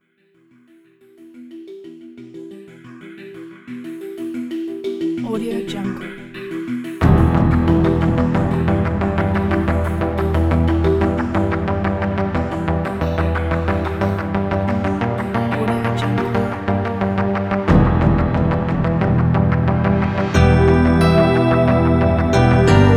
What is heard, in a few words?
suspense